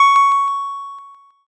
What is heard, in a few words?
ding